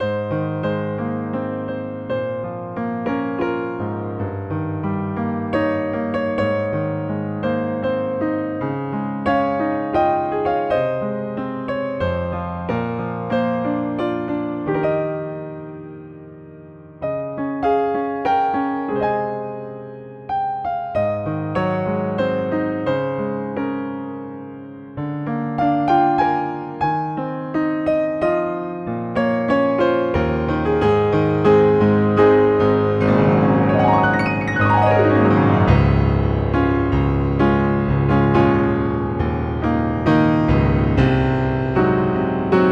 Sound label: Great Is Thy Faithfulness